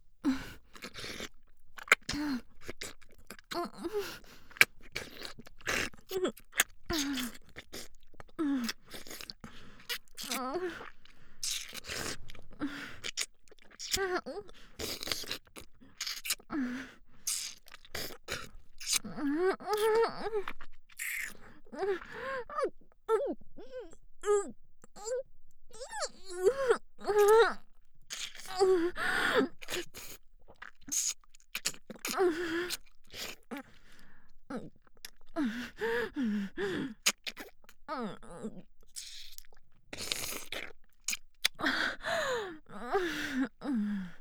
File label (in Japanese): フェラ音(口内射精)